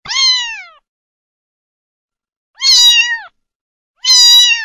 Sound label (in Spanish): gatito cachorro miau